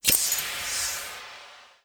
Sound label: gas; Animation Throwdown